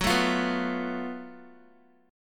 Gb Minor Major 7th Double Flat 5th, Guitar, chord; strummed; x,9,9,10,11,x; JGuitar

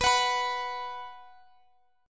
B 5th B, chord; strummed; x,x,x,16,19,19

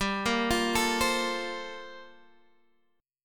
G Minor 11th G down; Gm11 arpeggiated

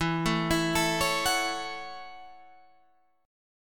F sharp Minor 7th

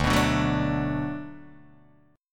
D 6th D down Guitar Open D, chord

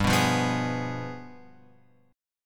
G 7th Flat 9th G; G7b9 Chord {3 2 3 4 X 4} strummed

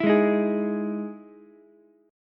E Major 7th E down Ukulele; EM7 strummed; JGuitar

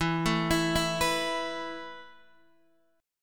E Suspended 4th, Guitar DADGAD; x,7,7,9,7,9

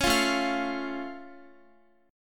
A Augmented A, Guitar; x,x,11,10,9,12; 4E,4A,5D,5G,5C,6F; strummed

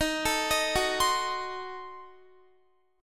D sharp Diminished 7th D sharp Guitar; arpeggiated

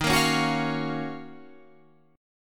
D sharp Minor 9th; strummed

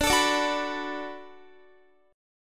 D 6th D down Guitar, chord; D6 strummed; tuning 4E,4A,5D,5G,5C,6F

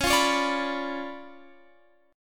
Db 7th Flat 9th, Guitar, Open F; x,20,17,20,17,18; Db7b9 strummed

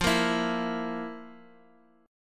F Major 7th Suspended 4th, chord; strummed; JGuitar

